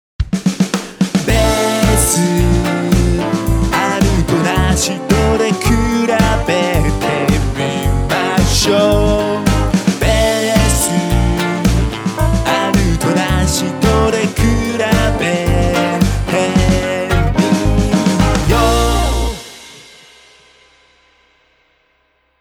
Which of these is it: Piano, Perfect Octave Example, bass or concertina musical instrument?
bass